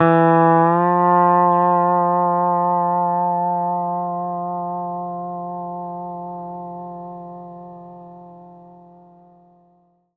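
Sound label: pedal steel bend up E3, Left; PEDSTL BENDS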